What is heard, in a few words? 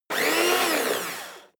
servo small on off